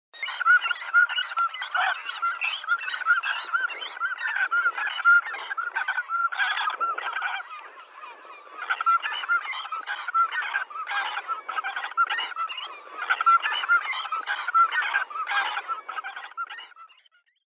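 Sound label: Cormorant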